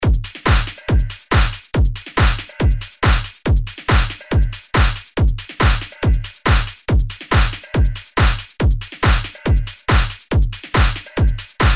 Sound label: DRUM LOOP DANCE 140BPM